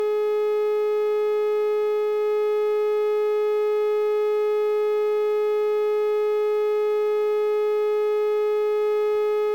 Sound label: Electric, Permanent Tone, High frequency, note A4; Sound Sower